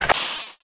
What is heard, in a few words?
sound concuss; MOTS